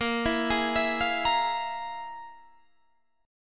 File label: F Minor Major 7th Double Flat 5th